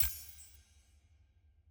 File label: ui page forward click; spirit blossom